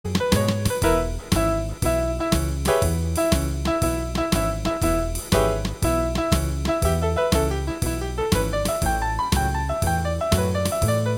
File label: blues